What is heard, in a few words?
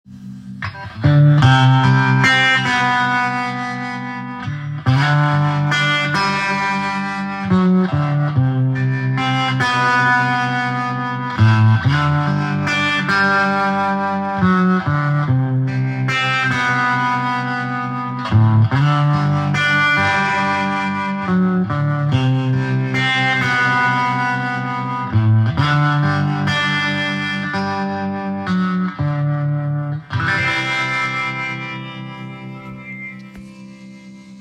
recording Rickenbacker, micro bassman low pony; First Low Pony; first build micro bassman low pony